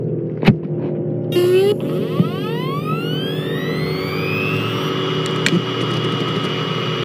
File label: Samsung HD502HJ Boot